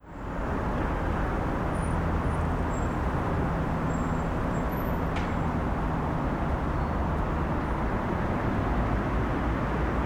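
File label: Laquinta City left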